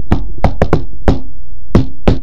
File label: DOOR KNOCK; sloodle